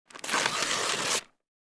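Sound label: tore off sheet of paper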